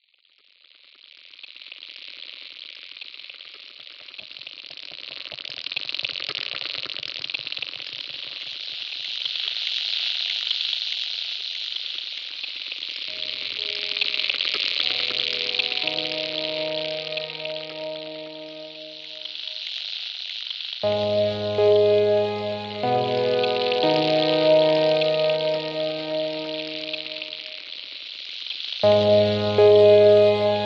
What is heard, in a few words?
Ambient music, motif